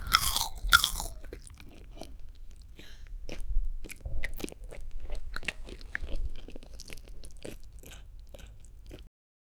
human eating apple

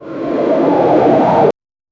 short fast flying whoosh; airplane

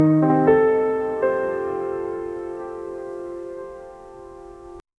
calm peaceful music

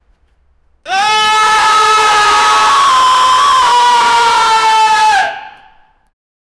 man holding his scream, anguish

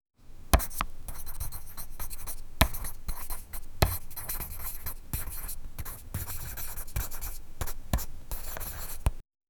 soft realistic sound, crayon

